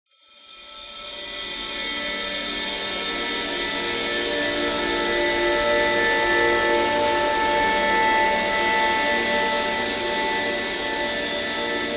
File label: sound scape dreamy yet sinister ominous confused whine; sound design; zapsplat